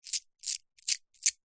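foley gemstones several small opal amethyst jade handling clutches toss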